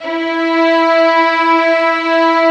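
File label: Vio legato E3; Digital Sound Factory Virtuoso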